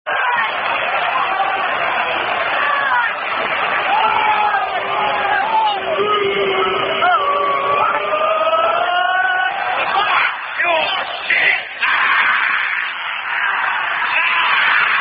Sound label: Leicester City Football Chants